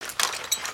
idle rattle

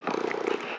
Pet Cat Purr; creatures pets; Lego

